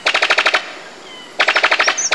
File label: STRANGE BIRD, JUNGLE BIRDS; Zero-G Ambient 1